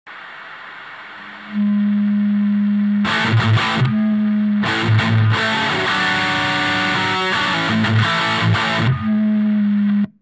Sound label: pedal audio sample